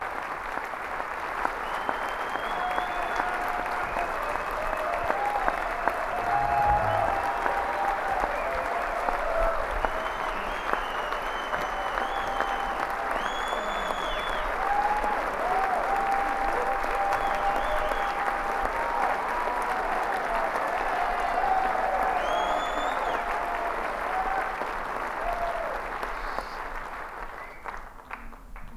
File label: encore applause; Bluegrass